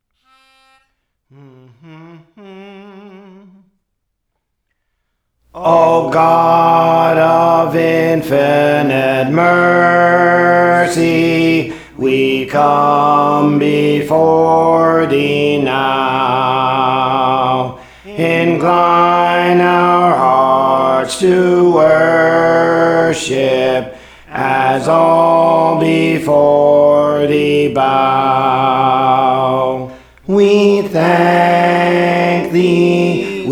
Alto, Songs; Praise For The Lord Training; O God of Infinite Mercy

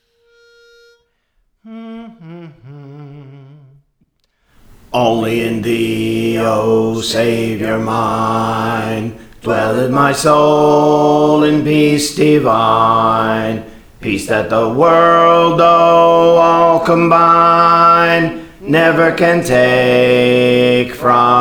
Bass; Praise For The Lord Training

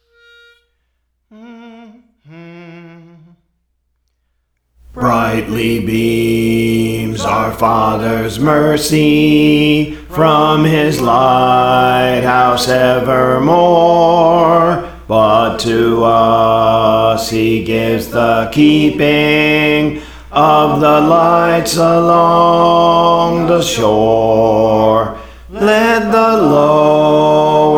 Bass, Songs; Praise For The Lord Training; Let the Lower Lights Be Burning

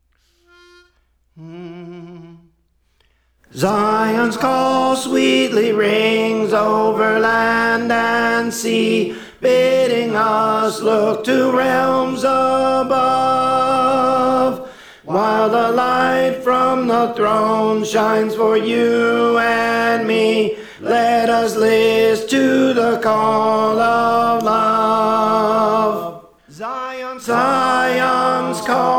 Tenor; Praise For The Lord Training

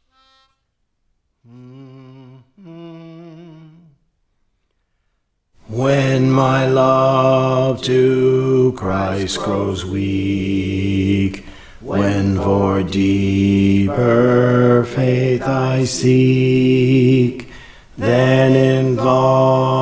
Bass; Praise For The Lord Training

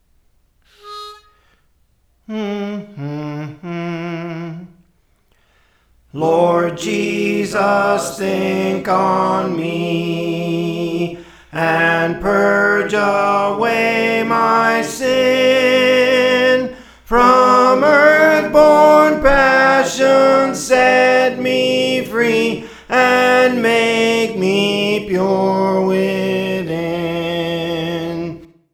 Soprano, Songs; Praise For The Lord Training; Lord Jesus Think on Me

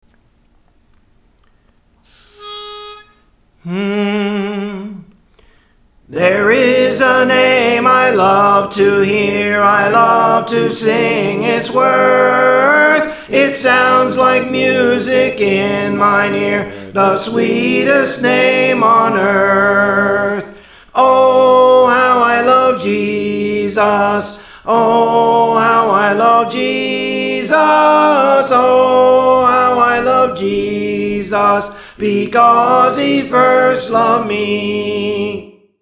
Soprano, Songs; Praise For The Lord Training

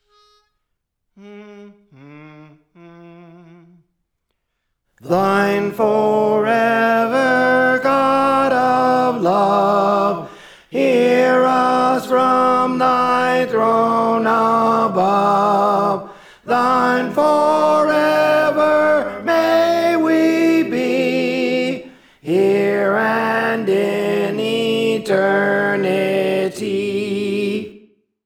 Tenor; Thine Forever; Praise For The Lord Training

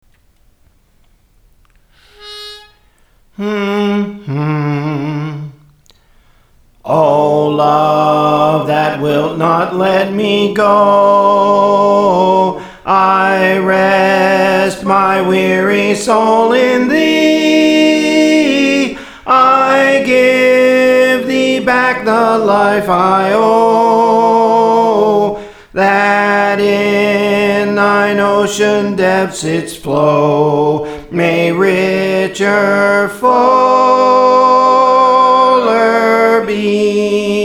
Soprano, Songs; Praise For The Lord Training

